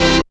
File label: dance chord